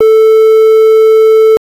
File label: Triangle wave